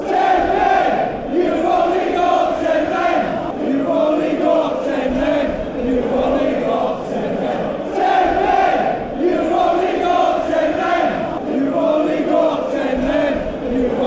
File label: you've only got 10 men, fanchants